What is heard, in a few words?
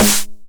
909 SD Tape Sat